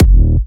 Jump Kicks, Bassdrums

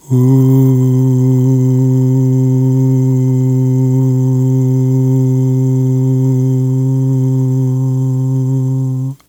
AHHH, Vocal; Polyend

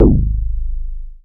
BAS FM DX-7

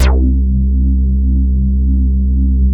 JUNO 106; Masterbits; Vintage Voltage; SEQ-FX

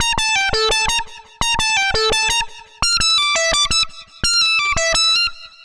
85bpm, 80s heat samples; musicradar; CopMono

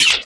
RSS, 160 BPM

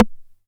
909 Rim Tape; Perc; Goldbaby Tape808 & Tape909; The Tape 909

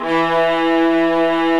String Vlas Sect Agitato; String Master Series; Vlas Sect1-2

